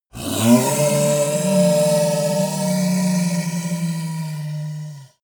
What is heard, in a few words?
monster dark growl hoarse; kreaturen